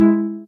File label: soft hitwhistle; osu